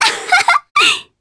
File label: Laugh, jp; Reina; kingsraid